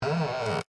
Creak Plastic, Texture, Creak, Plastic

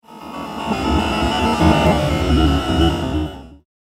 Data Delivery Droid, robot sound effect; Science fiction sound effect; star wars / trek style games

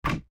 Cabinet Wood Door, Cabinet Wood Door Open; Cabinet Doors; Household; Blastwave

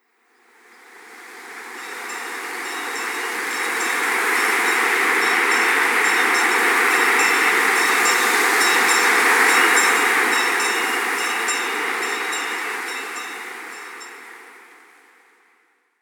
Bell And Strong Winds; Scary Soundscape Sound Effects; Horror Sound Effect; Bring Out Your Dead - Bell And Strong Winds

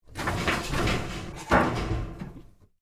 Laundry Chute, Drop, Clothes; Household; Laundry (Sound Effects:Household

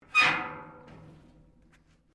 METAL RUSTY SCRAPE; Metal Sound Effects; Metal (Sound Effects:Materials)

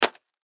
Impact Metal Pole Hit Windshield Crackle; Sound Effects:Materials; Blastwave

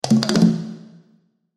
Cartoon Drum Pitter Patter Hand Drum Fast; Cartoon Music; Blastwave

